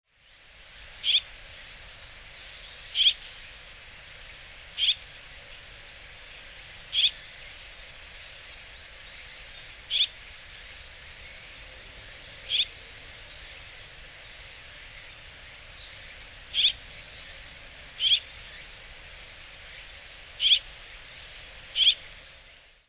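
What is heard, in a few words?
Red-rumped Swallow; koe; hyogo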